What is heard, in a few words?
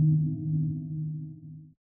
sonar Tail Air Far Directional; Barotrauma; Real Sonar